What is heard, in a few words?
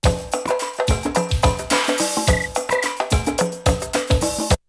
Drumloop; ZERO G - Datafile DISC 3